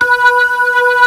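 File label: Hammond B3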